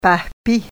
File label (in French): rire; contenus autochtones